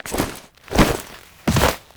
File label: dismount balloon; Drifter; Warframe; The New War